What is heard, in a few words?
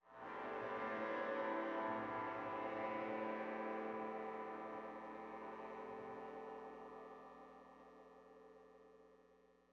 drone, electric, Pentatonic, DI, position7, light, oceanic, euphoric, interference; Eiravaein Sound; Yvaine